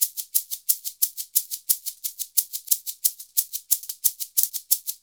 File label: SHAKER, Modern Percussion Loops